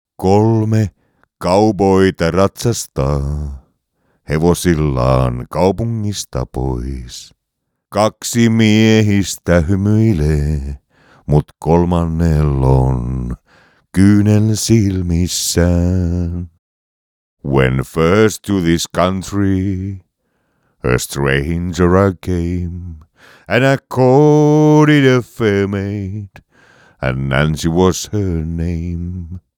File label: Music Vocal